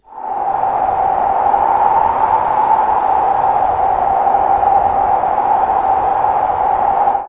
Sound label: WIND